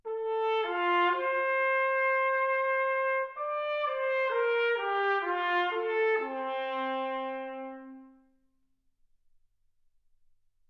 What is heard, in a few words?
trumpet